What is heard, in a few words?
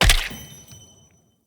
Player Bow Headshot Kill; Weapon Impacts; Sweeteners